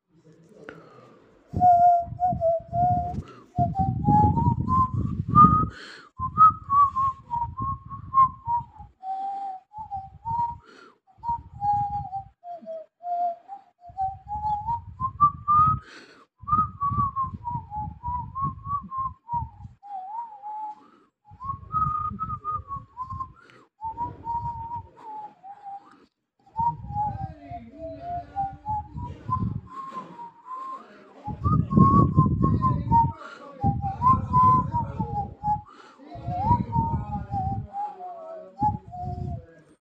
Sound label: nature whistle